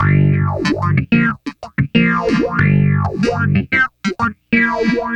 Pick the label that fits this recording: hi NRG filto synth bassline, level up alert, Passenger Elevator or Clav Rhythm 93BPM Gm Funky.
Clav Rhythm 93BPM Gm Funky